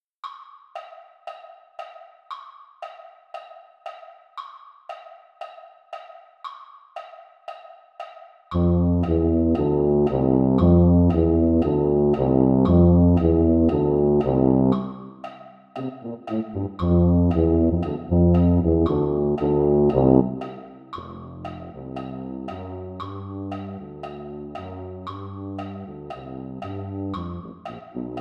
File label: tuba, brass; patriotic brass